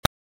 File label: click